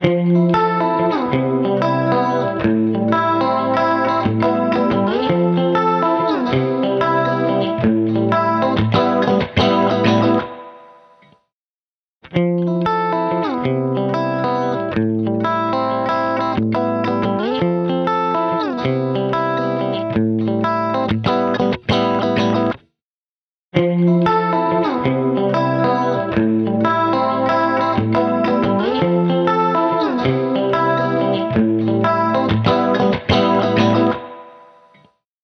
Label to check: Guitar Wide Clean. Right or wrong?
Right